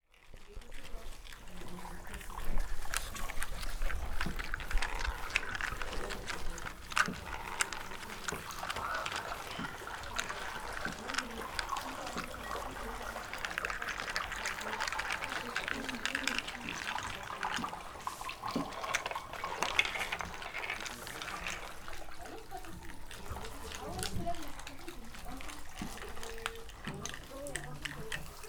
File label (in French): montage riviere